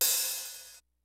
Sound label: FUNK, DRUMS; Kid Nepro; Elite